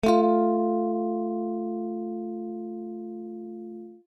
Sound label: string A tone A3, elec